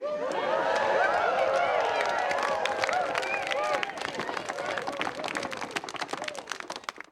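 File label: Crowd 100 Interior With Whoop; medium int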